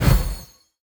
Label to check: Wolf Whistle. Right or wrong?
Wrong